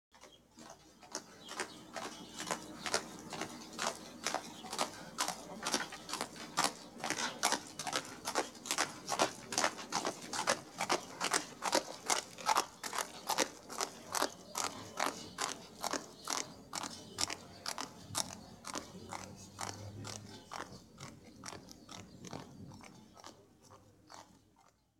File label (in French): Cheval Bruit de sabots sur lasphalte